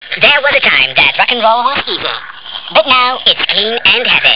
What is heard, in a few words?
acids; Amiga Soundtracker Sample Packs